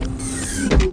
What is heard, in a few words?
Popgunf